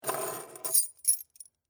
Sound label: Skeleton Key Ring, Pick, Up; Foley; Jangling (Sound Effects:Objects); BlastWave